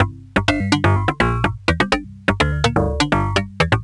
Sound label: perc dxfunk 125, Percussion Loops, Classic Chicago House